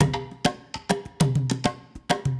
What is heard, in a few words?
main theme percussion short; godsofgold